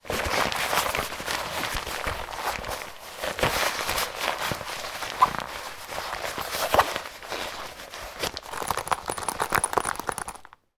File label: lunchbox childs fabric handling movement; zapsplat